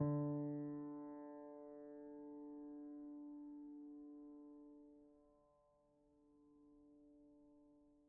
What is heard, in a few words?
GPiano sus D2, Grand Piano, Kawai; Chordophones; Sustains